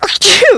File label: sneeze woman